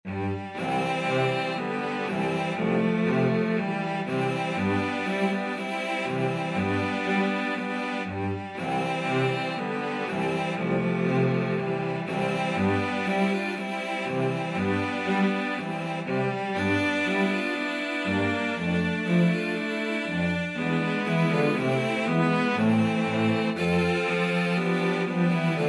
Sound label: cello quartet